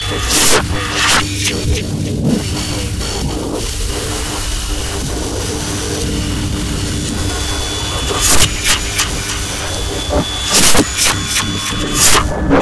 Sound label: wormhole ambience